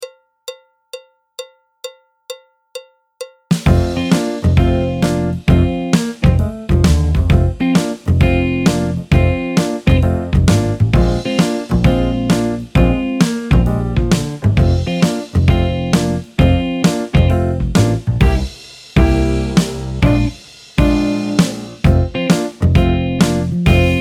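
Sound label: backing track, Jazz; alto, trombone, piano; Jazz Syllabus Level 4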